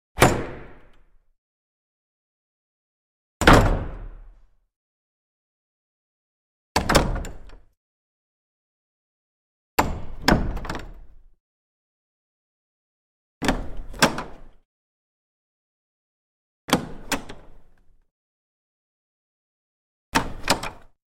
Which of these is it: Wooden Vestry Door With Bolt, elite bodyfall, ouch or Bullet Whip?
Wooden Vestry Door With Bolt